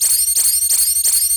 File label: Bomb Carry Fuse; Missions; Raid; Grn Bomb Objective